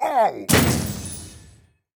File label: wukong enemy doombringer death